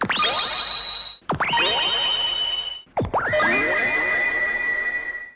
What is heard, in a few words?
magic kill